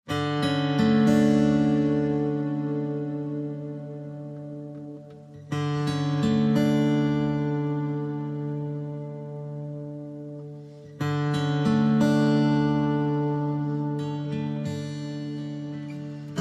Guitars Only

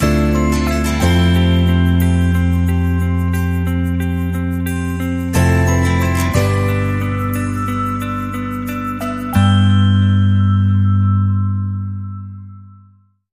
Gently Woken, Sting Downbeat

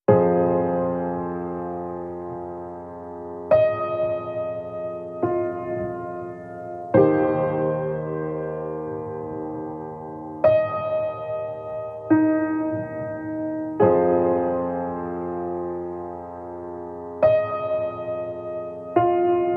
Piano Only

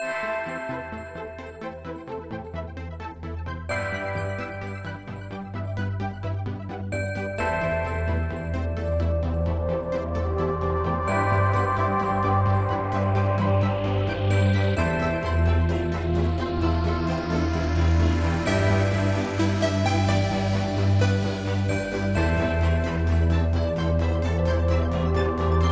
Villain, No Drums

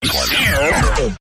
Short Garble Groan, Taylor Grand

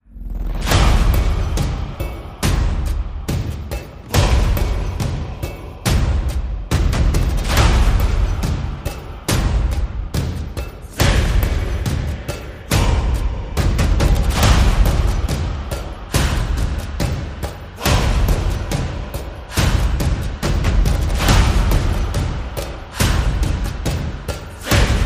Tribal

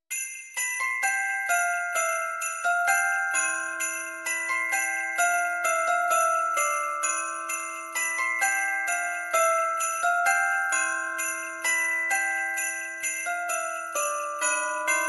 Ringing the Holiday Bells

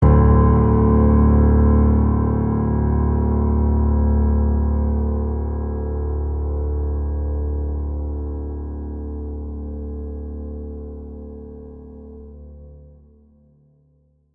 Silent Threat Low Piano Note

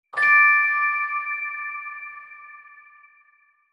Mystery Bell Ping

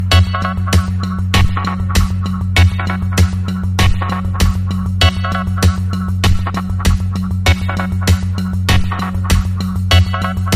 Cessation Suspense

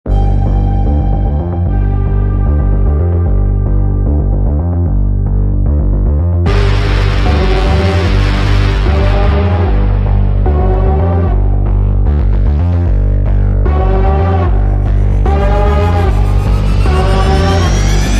Light Percussion

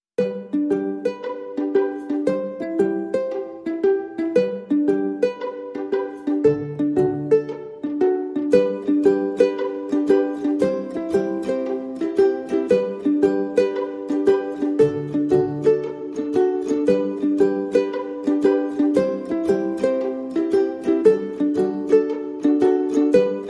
No Melody and No Perc

